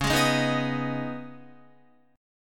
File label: C sharp-7th Suspended 2nd-C sharp-8,11,8,8,11,11-1-down-Guitar; C#7sus2; strummed; C#7sus2 chord; JGuitar